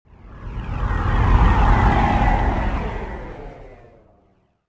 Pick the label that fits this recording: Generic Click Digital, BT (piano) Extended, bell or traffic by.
traffic by